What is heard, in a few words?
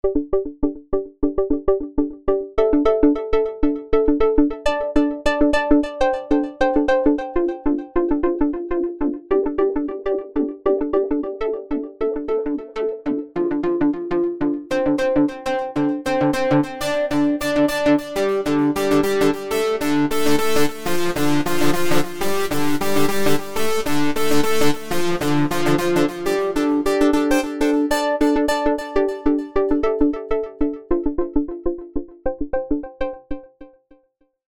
Early Arp